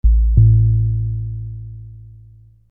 Low Sub

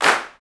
CLAPS, Africa Handclaps; Roland LCDP14 Africa VOL-2; Afr. Hands

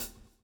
swing

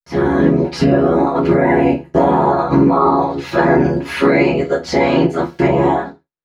female c#, Dance Ultra Voice FX; Mutekki Media

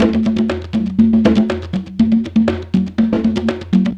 CONGA LOOP, Perc Loops, Techno Trance

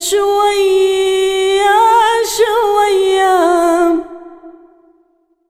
SINGER A, Arabian Traditions

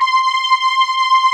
SMOOTH PADS, JV 1080; Pro-Rec - Synthsonic

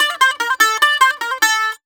BAGL D, BAGLAM, Mediteranean Traditions

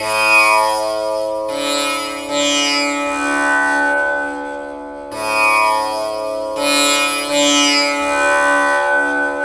TAMPURA; Spectrasonics; Heart of Asia

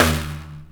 tik, pitch perc; East West - Global Sounds

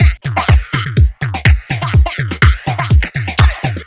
124 Full, Drum Loops 124bpm; Nu Dance Electro

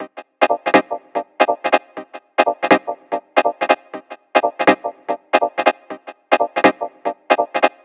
122 Cm, Melodic Loops; M8; Electronisounds

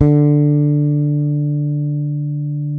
bass, sustain; Sampleheads; Will Lee Bass Library

